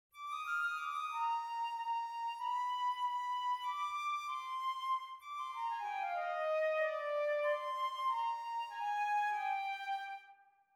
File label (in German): Sextetto mit Flote Oboe Clarinet Corno Basson